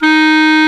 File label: CLARINET D#3; WIND; AKAI S-Series CD-ROM Sound Library VOL-2